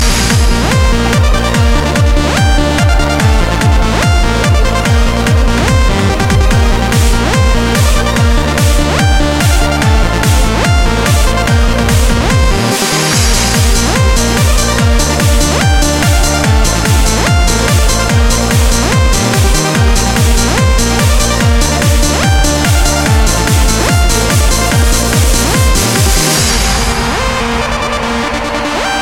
Best Trance

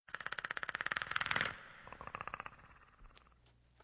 Snarl

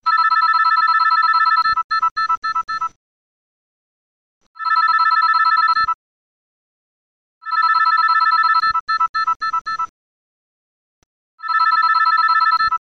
Cell Phone Sound

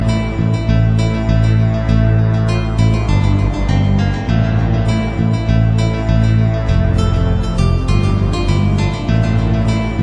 Ambiental Trance

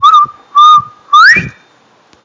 Whistle

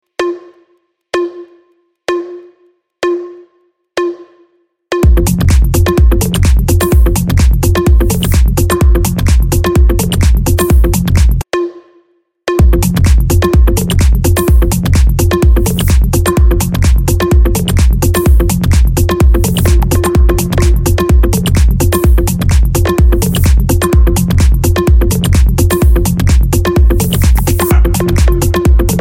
Minimal

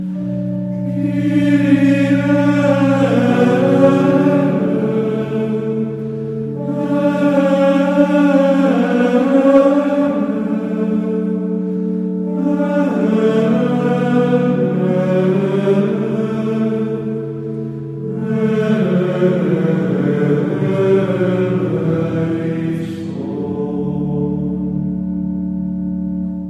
Kyrie Eleison 2 Chor

